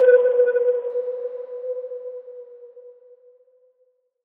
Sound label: Stress